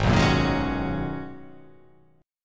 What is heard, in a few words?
G sharp Minor 11th G sharp, chord